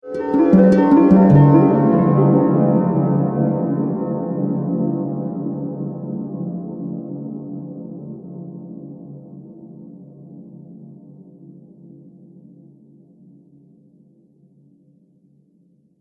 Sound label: Harp Strange Ambience, Musical Sound Effects